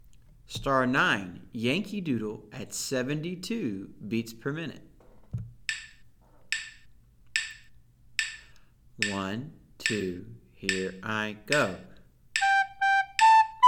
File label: 72 bpm; Recorder; Yankee Doodle; Be A Recorder Star Songs